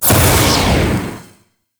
Swarm Tear Dissolve; Entrati

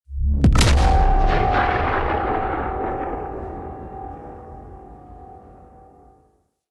Dropship Warp In Boom; Venus

